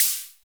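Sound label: SDS HH MED, hi-hat; Best Service; Real Mega Drums VOL-1; SDS V KIT 1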